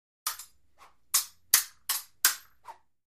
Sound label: Fencing Swish Hits